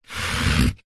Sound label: vehicles bicycle helmet slide ground; zapsplat